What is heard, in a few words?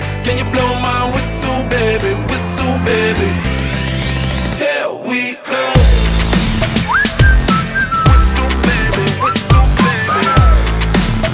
whistle ringtone